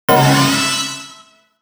game start; Radirgy Perfect

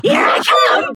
Hit

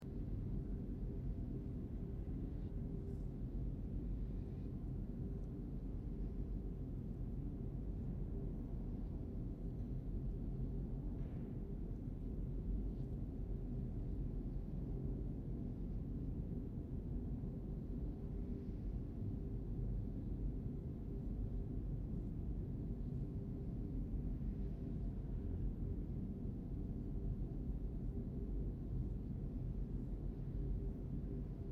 asylum underground ambience

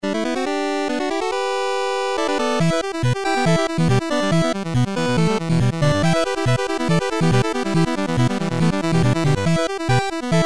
Game Over Win; The Last Vikings; android

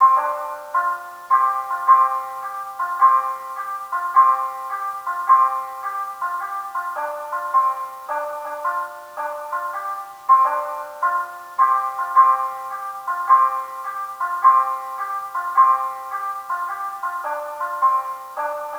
bgm shamisen; yakuza ryu ga gotoku kiwami 2 pc gamerip

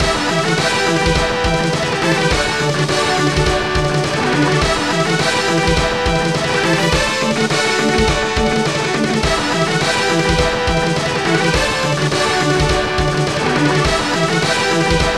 Character Theme; F-Zero Climax; GBA; Story Mode

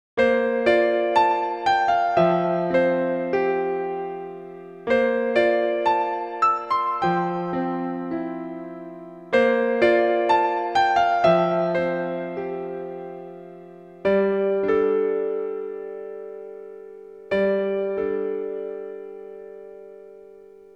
piano sketch; Nightmare Project YAKATA original soundtrack 1999

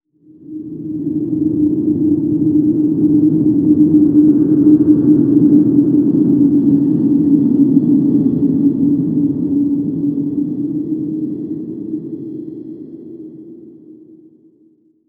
environment wind stinger

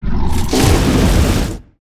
ultimate attack; arkhan

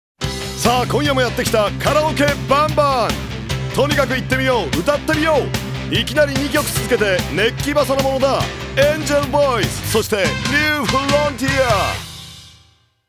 karaoke

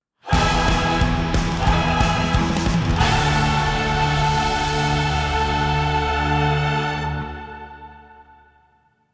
Battle; Xenoblade Chronicles 3; Moebius Battle (Action End - Unused)

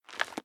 Cave Crouch; The Inn

